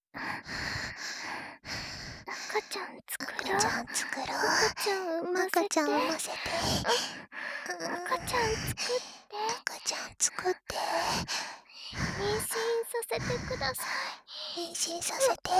喘ぎ, バイノーラル; 赤ちゃん作ろ♪; ハーレムオナサポ 4人のメイドがご主人様のオナニーをお手伝いするバイノーラル～文、千曜、名月、莉桜～

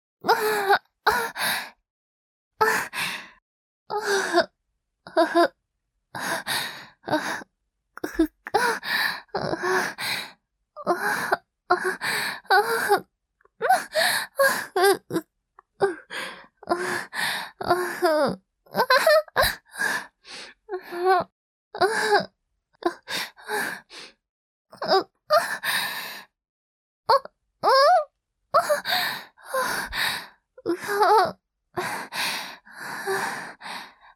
喘ぎ抜粋集

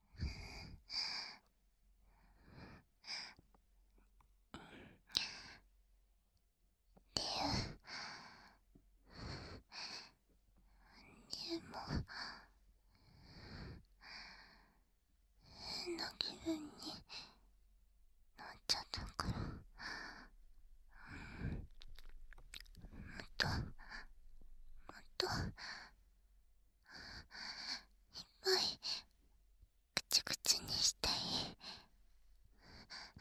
じゅるじゅる 右, ASMR

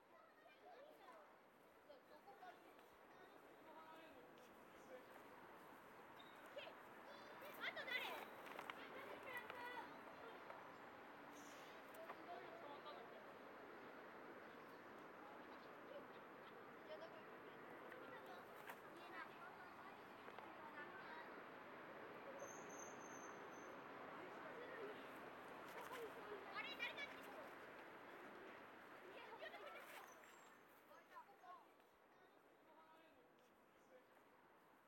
体育倉庫でセックス（SEのみ）, ASMR

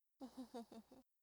笑い声; ループボイス

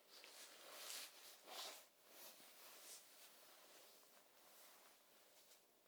衣擦れ寝ころんだ足元へ; 動作系

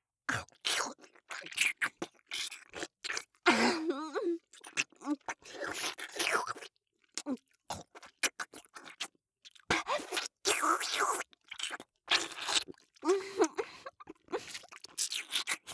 BGV(フェラ)

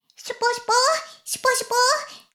シコシコ音声; 古き城のドラゴニート2

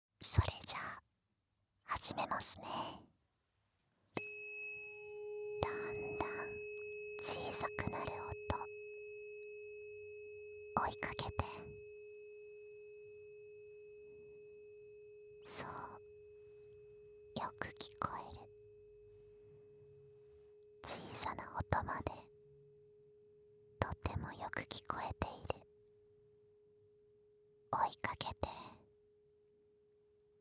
音叉ヒーリング 417x528, ASMR

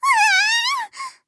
"うわああああ！", クール; ハイグレボイス集

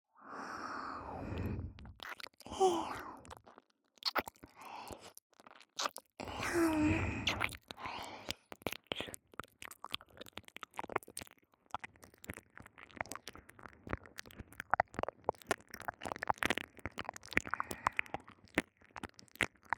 左耳舐めループ; SE有り

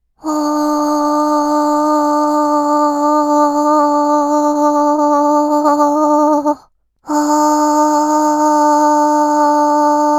あーーーーーーー

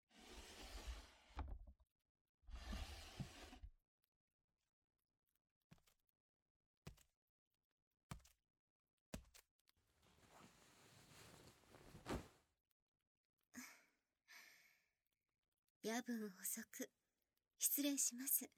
添い寝; 湯たんぽｔｐ添い寝; 02夜

